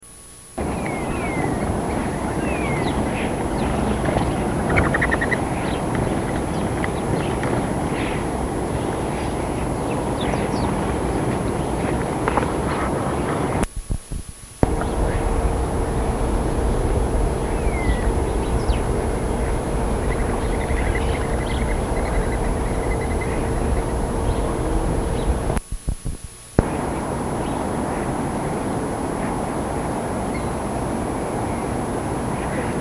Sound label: Birds; winter